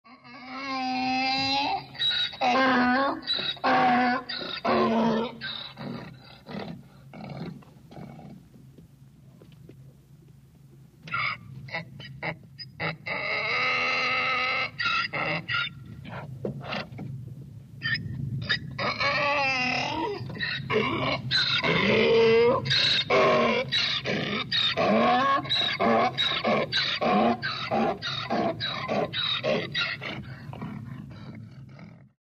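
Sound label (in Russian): osel golos, donkey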